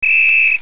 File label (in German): trillerpfeife